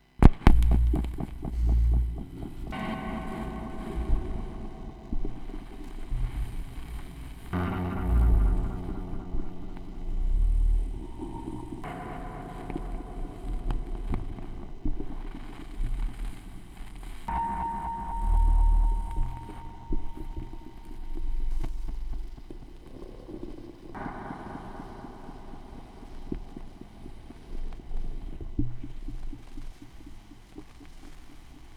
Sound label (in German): schallplattengeraeusche, Tape Echo Mix